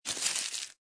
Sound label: recycle clear